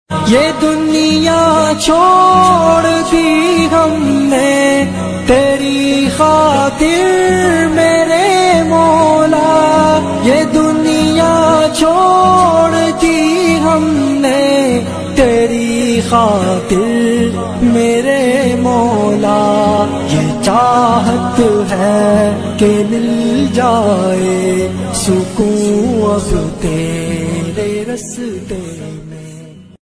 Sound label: Islamic naat ringtone